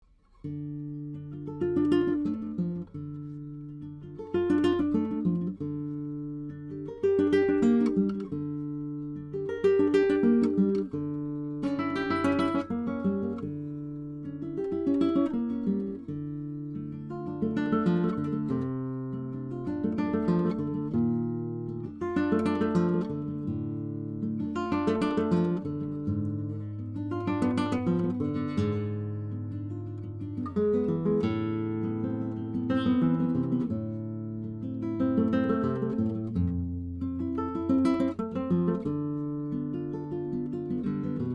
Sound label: Flamenco